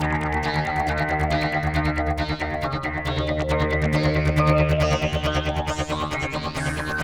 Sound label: SYNTH SEQ 137; AMG; Global Trance Mission VOL-2